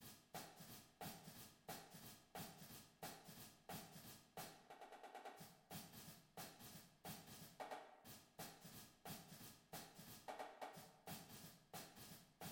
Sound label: snare